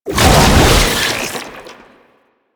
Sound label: Proto Infested Babau Tentacle Flail Stop, Enemies